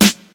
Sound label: high quality crunchy snare g sharp key; snare drum samples